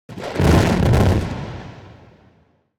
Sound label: Ability Mimic Explosion Distortion; Lurker; Evolve; Gorgon